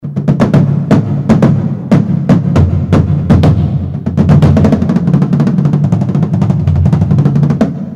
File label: Drum